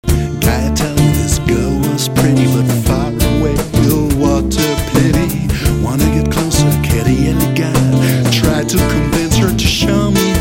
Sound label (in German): Song Englisch